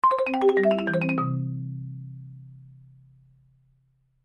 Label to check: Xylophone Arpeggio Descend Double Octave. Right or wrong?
Right